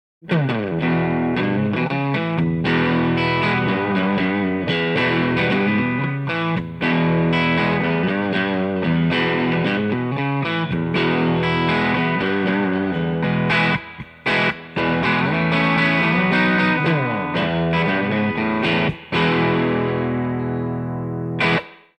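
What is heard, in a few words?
crunch drive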